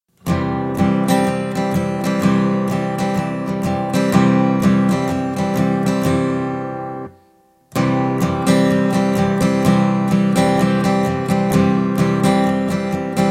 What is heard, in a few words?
folk guitar